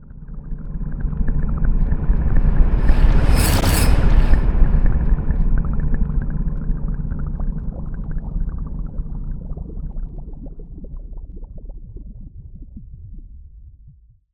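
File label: blast bubbled remains forward reverse; Cinematic Metal Impacts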